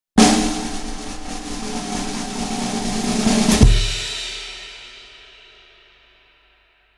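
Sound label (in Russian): цирковой номер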